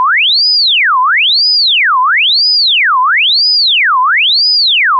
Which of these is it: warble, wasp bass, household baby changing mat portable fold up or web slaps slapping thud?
warble